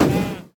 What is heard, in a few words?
tower eldrictch Channelers mutation Hex cast